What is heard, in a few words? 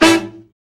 BRA SECT; BRA SECTIONS